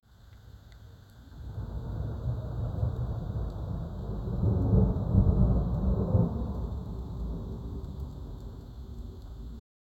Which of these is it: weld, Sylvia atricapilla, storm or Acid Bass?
storm